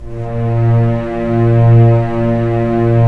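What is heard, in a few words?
STR String Section; Roland - LA Composer vol. 4; Full Sec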